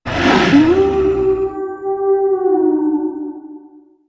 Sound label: Howl; Lycan